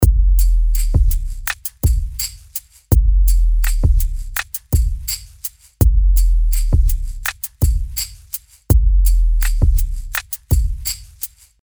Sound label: Hip Hop RnB Hip Hop 83bpm